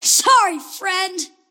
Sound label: deny pain; kidvoker; dota; invoker